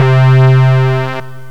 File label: Square Wave, Synth lead; GMPlay